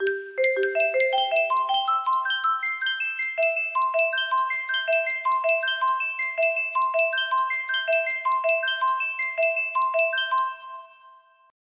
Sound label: Loading Sound, music box melody; animal crossing wild world